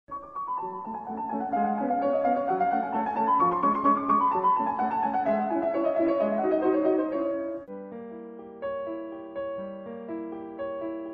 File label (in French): jeu musical indices classique